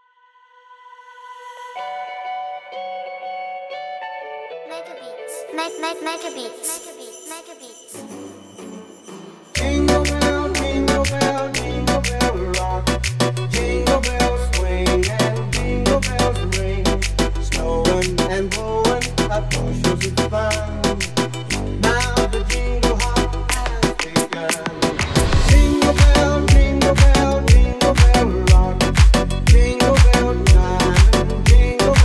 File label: jingle bells azerbaijan remix; megabeatsz